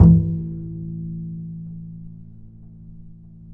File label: E 3, bass, acoustic fx; Spectrasonics; Bass Legends